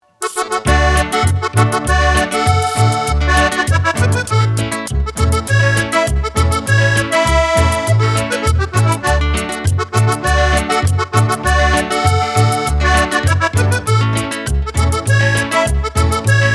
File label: mariachis